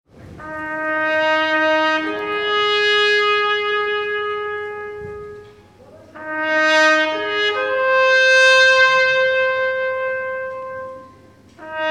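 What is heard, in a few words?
Funeral Silencium Trumpet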